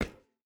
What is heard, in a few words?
footstep rubber boots metal run; Rust